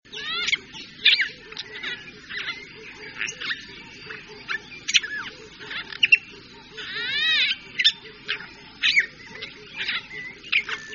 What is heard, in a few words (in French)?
choucador a oreillons bleus, chant